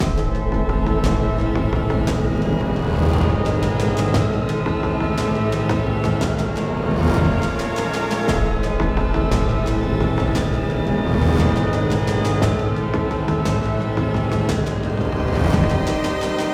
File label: Dialog Danger Loop; skykeepers; ps4 windows xbox one gamerip 2017